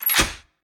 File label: lock; Angry Zombies